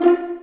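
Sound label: Vio pizzicato F3; Digital Sound Factory Virtuoso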